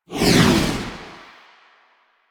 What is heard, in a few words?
Motorcycle Passby Whoosh Medium; Motorcycle Ambience; Vehicles